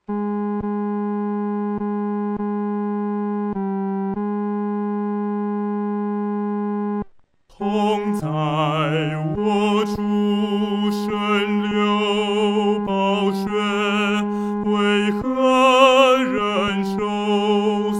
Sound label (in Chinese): 独唱（第三声）